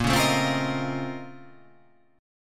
A sharp Minor Major 11th

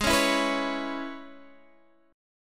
E 6th, G sharp

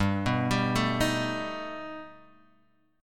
B Augmented 7th G

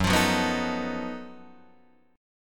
F sharp Minor Major 13th, Guitar DADGAD

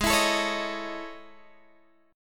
A Major Sharp 11th, Ab

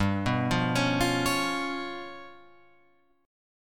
G 7th Flat 5th, down, Guitar, Open F, G7b5 chord; arpeggiated

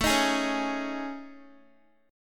A Major 7th Flat 5th, Guitar; AM7b5; strummed

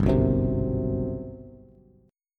G Major 11th, Bass; strummed; JGuitar

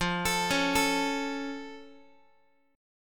F Augmented F Guitar; arpeggiated

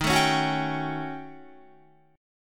Eb 7th Sharp 9th Flat 5th, Eb, Guitar; Eb7#9b5 strummed; JGuitar

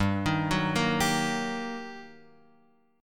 G Minor Major 7th Sharp 5th, down, Guitar, Open F; GmM7#5 arpeggiated; JGuitar

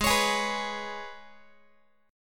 Ab Minor Double Flat 5th Ab, Guitar; strummed; 4A,4E,5A,5D,5G#,6C#; JGuitar

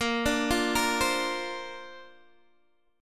A sharp Major Add 9th, Guitar DADGAD

